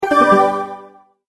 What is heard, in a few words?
multimedia tone, Goodbye